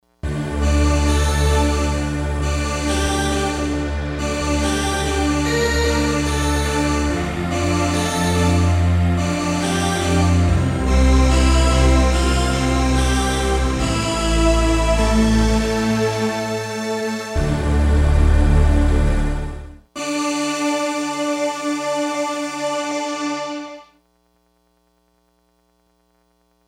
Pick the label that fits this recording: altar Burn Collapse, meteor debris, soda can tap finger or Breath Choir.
Breath Choir